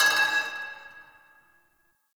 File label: Pipe Cement Drop